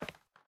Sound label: Step heel Wood; miside demo